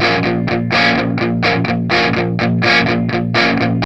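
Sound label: 125, Vintage Blues Guitar rhythm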